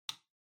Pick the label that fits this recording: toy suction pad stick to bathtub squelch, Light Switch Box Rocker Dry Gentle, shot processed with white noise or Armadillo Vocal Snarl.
Light Switch Box Rocker Dry Gentle